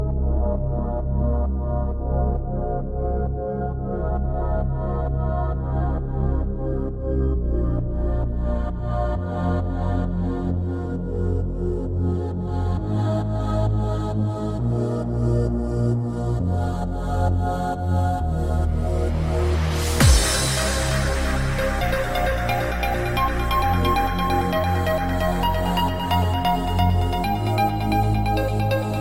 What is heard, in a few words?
HTC REMIX